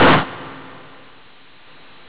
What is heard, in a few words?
Car